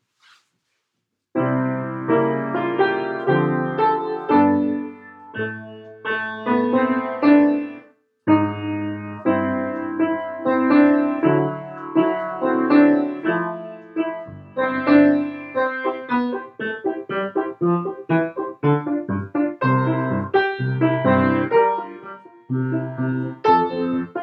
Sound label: reprise instrumental